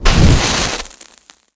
candle, hamster race; Spanish

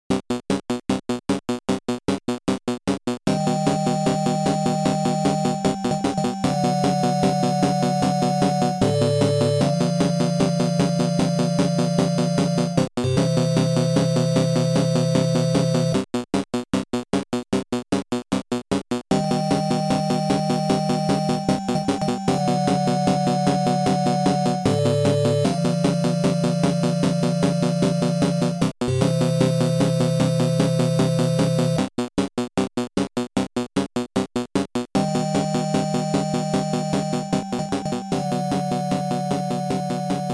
Reverse Shooting Star Music

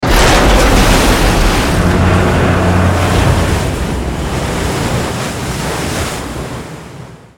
Enemy Battleship Death; Godzilla Smash3

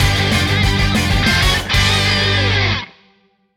JINGLE CONTINUE; the magic nightmare; android ios mobile gamerip; WHITE JINGLE

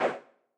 Swing

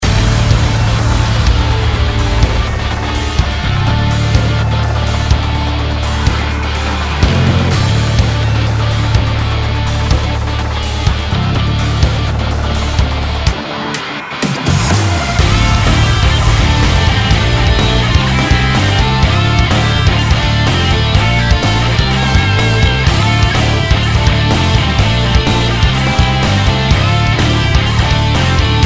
Raid Boss; mist train girls android ios mobile gamerip 2020

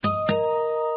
Dingdong; Dr. Driving 2; Android

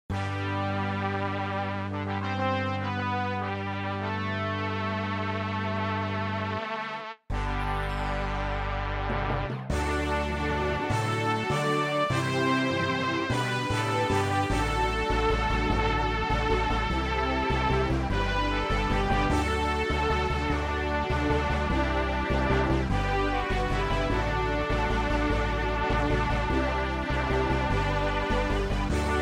MIDI Theme

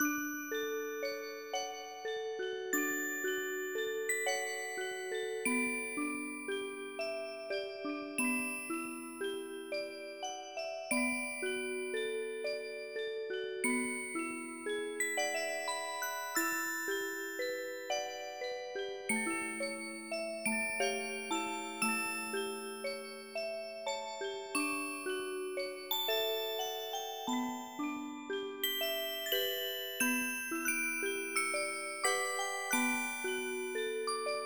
MusicBox; My Merry May; ps2

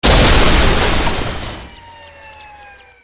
Enemy Helicopter Death; Godzilla Smash3

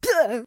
bump; Hank

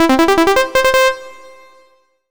Solo Clean Sawtooth Positive; MUSIC EFFECT; startide

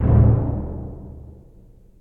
B Drum; Torchlight III; PS4

